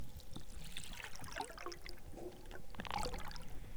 eau, surface

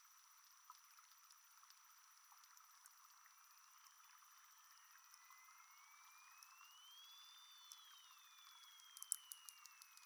DAUPHIN RISSO ralenti 4 fois

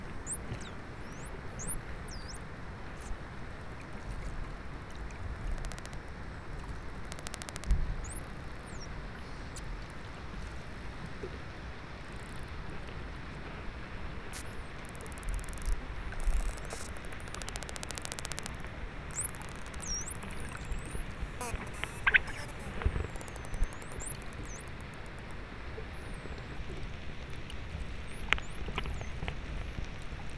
DAUPHIN BLEU BLANC

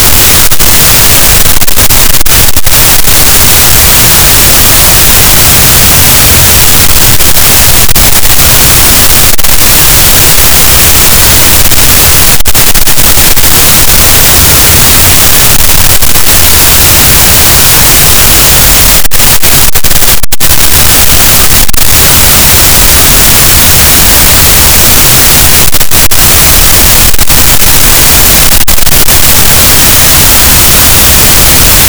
cachalot negatif, gaussian noise; Physeter Macrocephalus; SNR -6dB

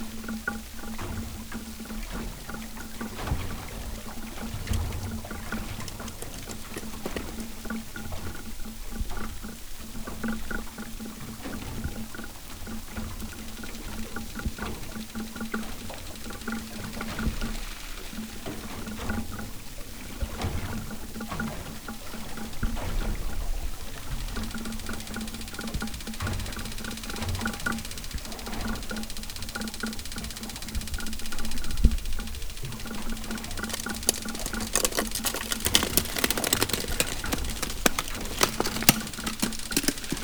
gravats et cliquetis navire; NORTEK

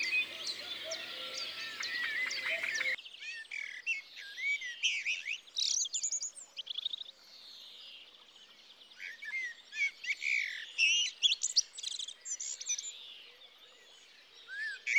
with energy merle noir; TOULON; PRESQUILE

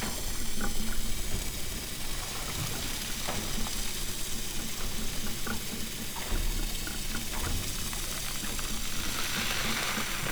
navire moteur; NORTEK

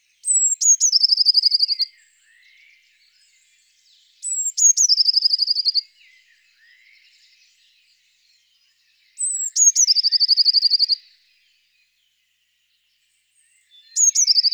mesange bleue; TOULON; PRESQUILE

